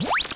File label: MOVE; C64 Dreams; Multi-Sound Default